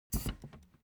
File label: object chair office hydraulics down stepwise; smartsoundfx